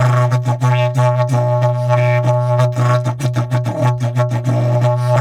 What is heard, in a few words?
Didgeridoo; DIDGEHORN2